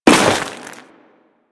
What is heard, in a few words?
Crewman Step Ice, Footsteps; Warframe; Corpus